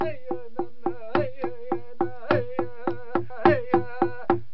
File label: 106 bpm native american heya